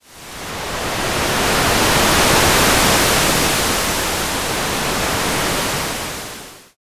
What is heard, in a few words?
wave